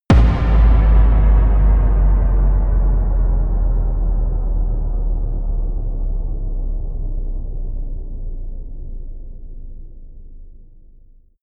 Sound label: kick impact; hits explosionen; impacts